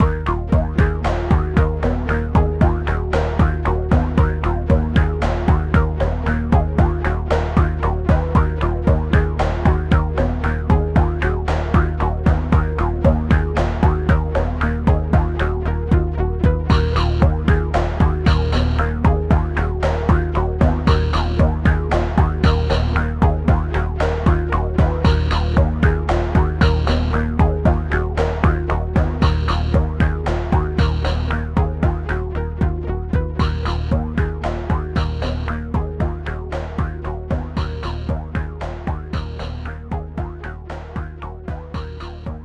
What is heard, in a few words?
music - Death; StarDrone; PS3